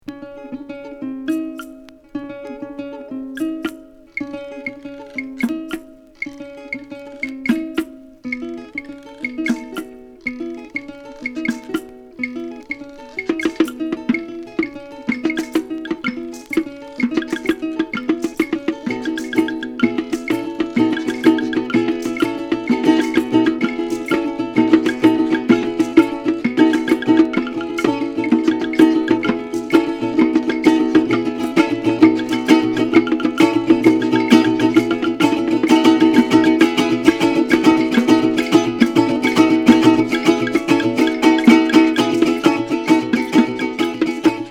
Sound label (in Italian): strument concerto